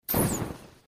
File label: magicstrike; The Witcher Hunt